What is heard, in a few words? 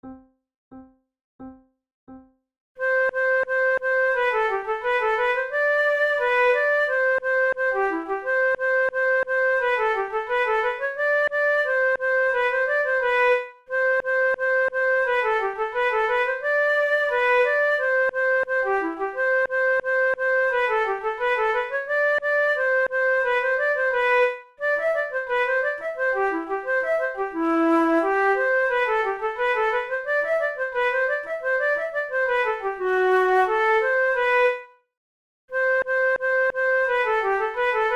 pour la flute duos allegro; devienne; nouvelle methode